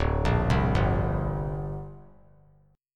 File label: D 7th Suspended 4th Sharp 5th down, chord; D7sus4#5 arpeggiated